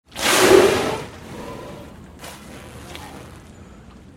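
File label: orca; kosatka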